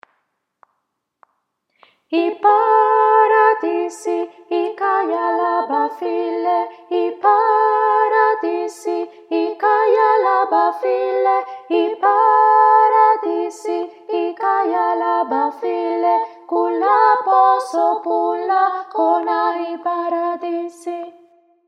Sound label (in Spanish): sopranos 1 y 2; ipharadisi